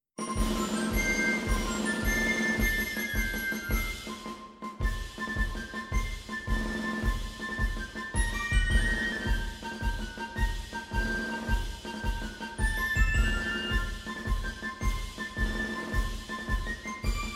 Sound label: flutes and drums